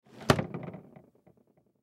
Mini Refrigerator, Mini Refrigerator Close Door Rattle; Appliances; Household; Blastwave